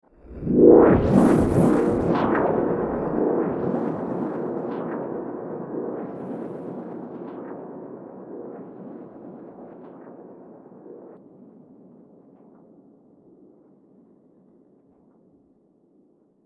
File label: Escape Pod Deployed, science fiction